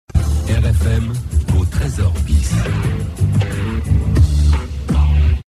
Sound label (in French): jingle; RFM; tresors bis